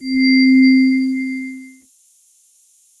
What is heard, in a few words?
Tone; GoogleVR; HelloVR